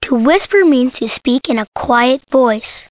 WHISP

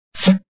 Collect Money; money maze

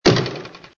arrow hit wood; Casanova

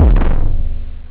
distort, drums techno; OctaMED SoundStudio V1

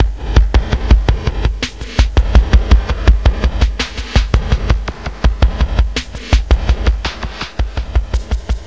808 brake; meetmediaband

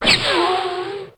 boost ball; Ultranium 3D

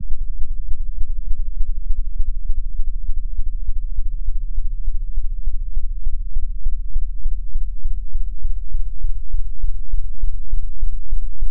Phased DX7; Instruments

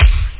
bdrum

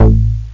big bass

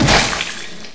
crawler death; Ferazel's Wand Demo

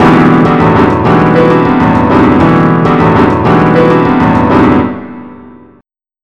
BOSSA; BUSKER L

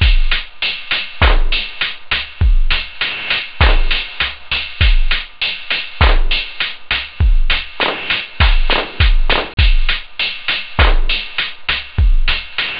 acid, house; OctaMED SoundStudio V1

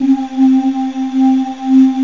Voice Ooh; GMPlay; Ensemble

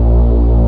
drone; CyberNation

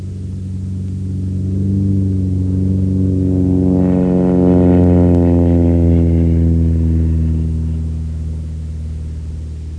cessna, transportation; Web Clip Empire 150,000

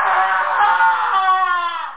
yell falling

